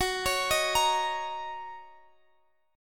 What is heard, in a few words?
Gb 6th Gb